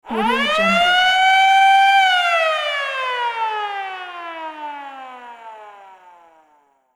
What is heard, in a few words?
long arena siren blast